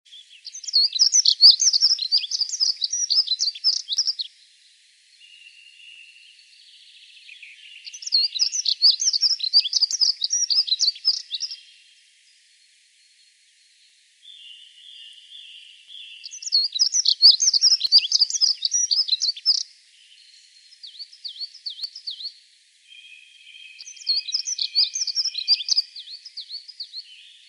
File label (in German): Heckenbraunelle; Ornithologie